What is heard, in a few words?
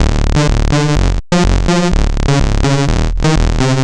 Sicklectro Bass; Sonic Foundry (Sony Creative Software); Underground UK House & Electro